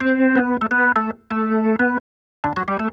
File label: Organ Lick; Ambient Grooves; Organs Licks